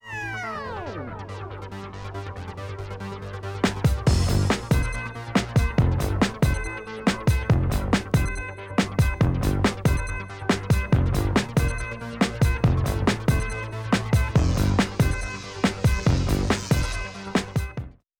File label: Reloop Concorde Green Instrumental